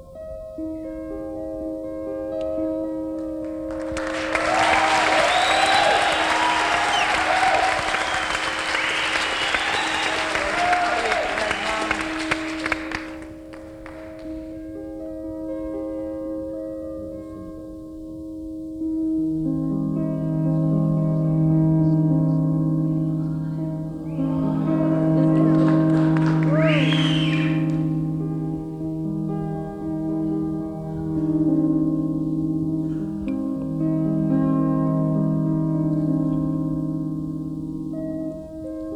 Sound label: Piano Improvisation